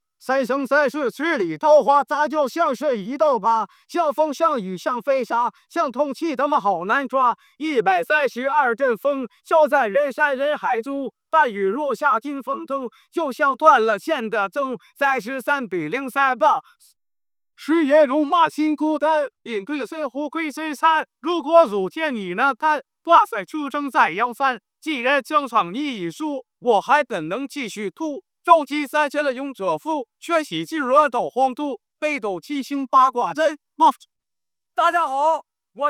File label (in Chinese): 喊麦; 杀马特团长另类喊麦合集